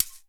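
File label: GATE percussion; Crypton - DrumBase5000; GATE KITS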